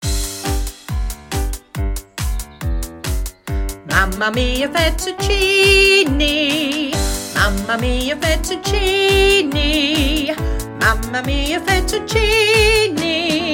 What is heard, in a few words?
Backing Track